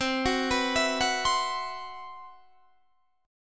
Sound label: C Major 7th Flat 5th C, Guitar Open D